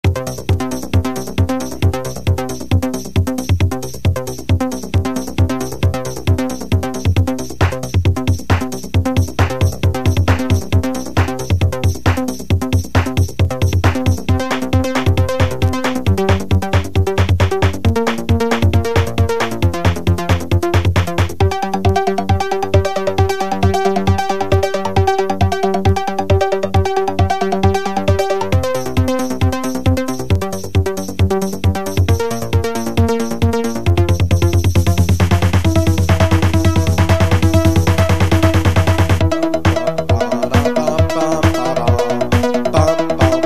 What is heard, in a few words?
Hardcore Disco (vocal)